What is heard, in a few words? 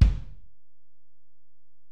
Ac BD, Alesis DM-PRO; 300 Drum Machines